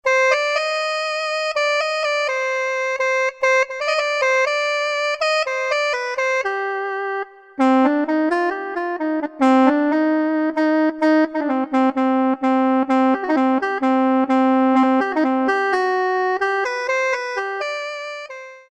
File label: Shanai, Korg 05RW